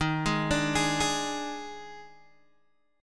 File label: Eb Major 7th Suspended 4th, Guitar Open G; down